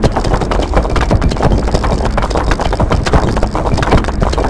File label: roll brick large, terrains; Tony Hawk's Project 8; XBOX